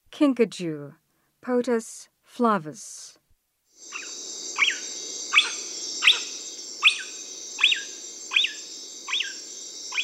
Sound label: Kinkajou, Mammals of the Rainforest; S. America